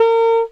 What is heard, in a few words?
sax; Winds